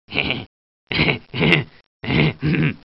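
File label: male laughter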